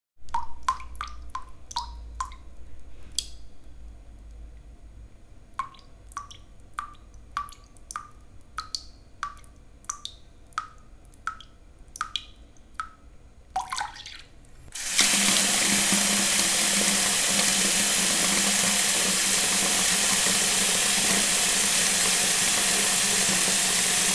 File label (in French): bruits d eau